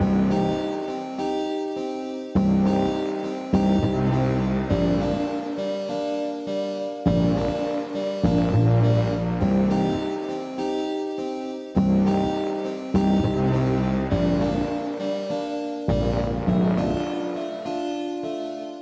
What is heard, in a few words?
Claws Loop No drums theremin; jenny leclue detectiv